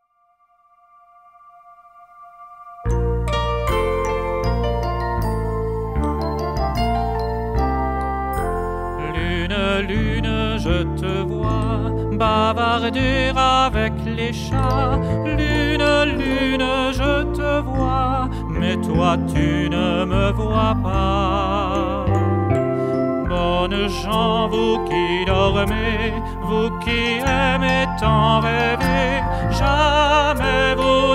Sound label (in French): Pour la lune 1ère voix seule; Ecoles Qui Chantent 1999-2000